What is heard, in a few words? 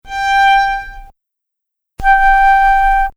Violin Flute